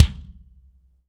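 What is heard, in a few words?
BD DRY, bass drum; Real Mega Drums; Best Service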